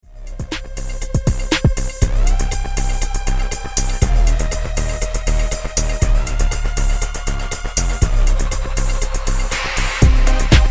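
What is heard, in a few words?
House instrumental 120BPM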